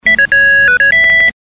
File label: bell; cstrike; rocinha